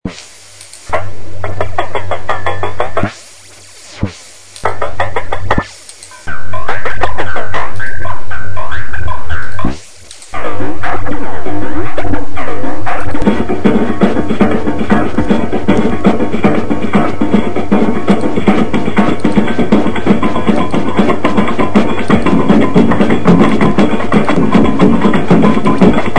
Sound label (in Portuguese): samba noise